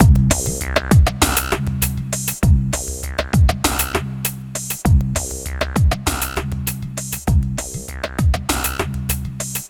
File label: Elektro, Processed Percussion; Sonic Foundry (Sony Creative Software) - Rhythmicronics Processed Percussion